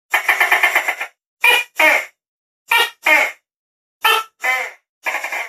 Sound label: tokay gecko tok kek; animals